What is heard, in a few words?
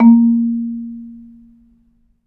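MARIMBA C6; ILIO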